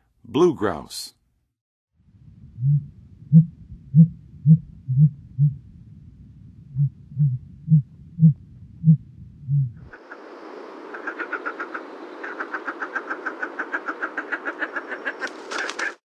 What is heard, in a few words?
Blue Grouse, Birds of North America; Western USA; Western USA Songbirds